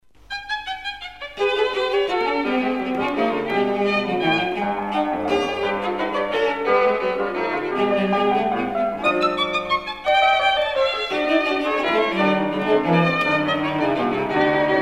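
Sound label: piano trio; braga f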